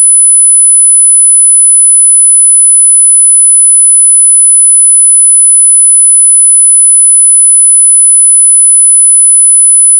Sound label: 10k